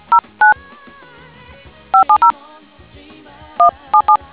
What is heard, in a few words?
dtmf